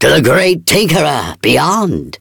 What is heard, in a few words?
death; Awesomenauts